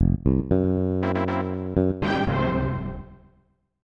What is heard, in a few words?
Appear; Hanjuku Hero; snes